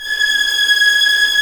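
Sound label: G#6 tremolo f violin right, violins tremolo; East West; The Ultimate String Collection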